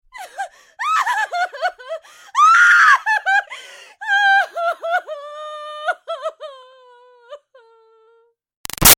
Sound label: Human Female Crying Hysterical; Voice; Big Room Sound